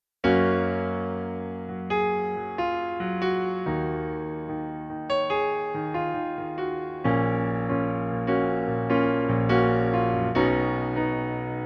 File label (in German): Pianoplayback in F#-Dur